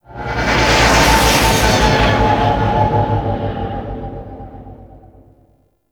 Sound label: Fly By Slow; Vehicles; Warframe; Liset; Nora Liset